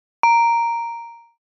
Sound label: Elevator bell ring tone